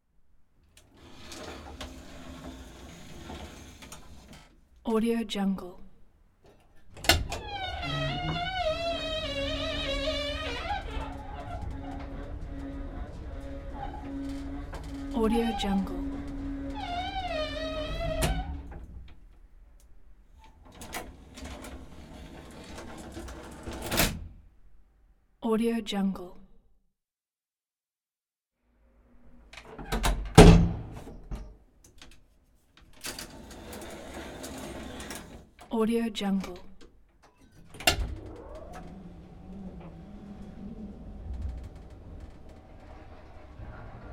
old elevator

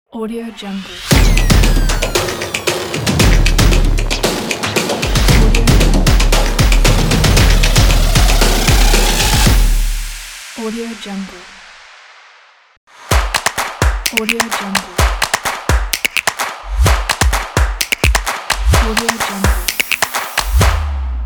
drums on logo

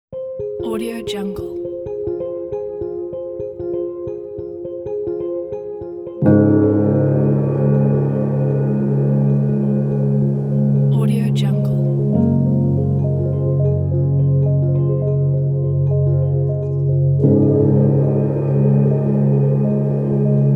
soft winds

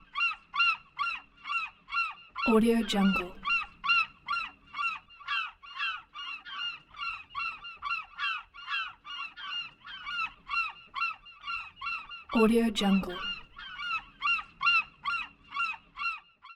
seagull calling

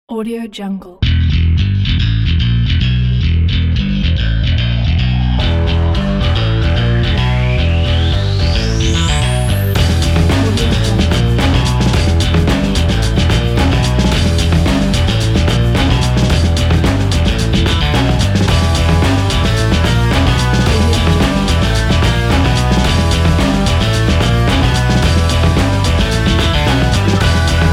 sport breakbeat extreme

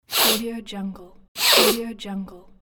sniff

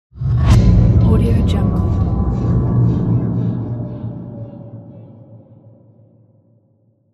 low whoosh alien; archisound

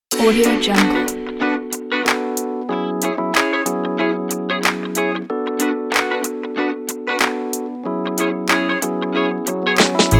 groovy rnb

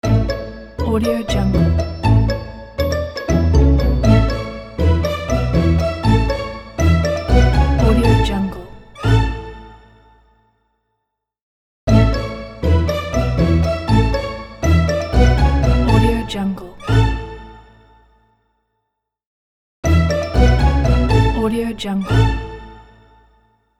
happy baby logo

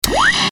tv tune out; sound ideas

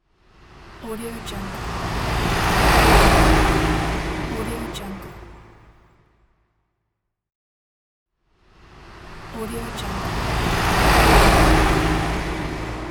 car pass

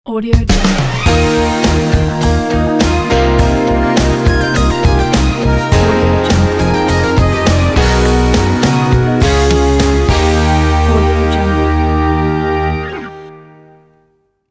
business motivate logo

